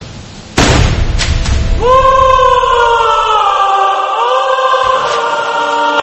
hooooo

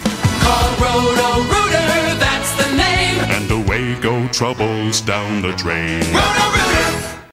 jingle; roto rooter

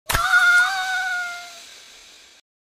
scream; ice age

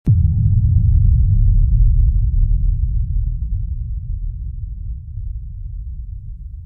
deep impact

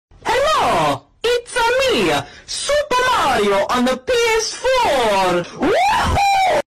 wahooooooooo

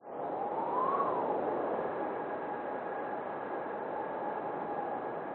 wind blow

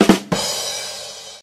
drum effect tu dunz

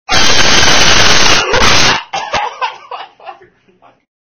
scream; color test

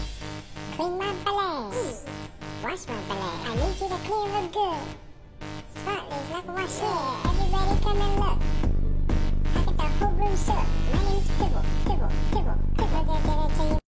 dj dog; pibble

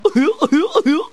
ayuk ayuk; gex

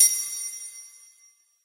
triangle

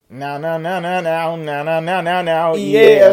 human guitar

